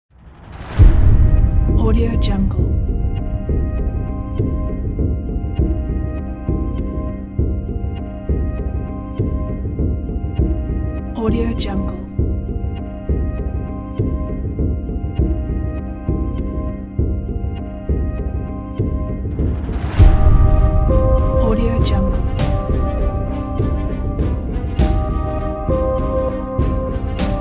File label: suspense trailer